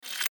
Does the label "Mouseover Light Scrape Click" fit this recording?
Yes